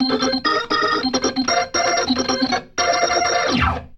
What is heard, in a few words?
Riff; InVision Interactive; Keith Emerson; The Most Dangerous Synth and Organ; ORGAN RIFFS